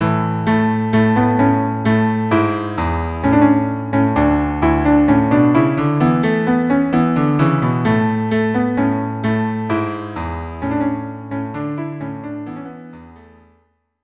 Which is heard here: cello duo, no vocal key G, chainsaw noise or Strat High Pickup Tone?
cello duo